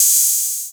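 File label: 808 open hi hat; Wizoo - Platinum 24 Electronic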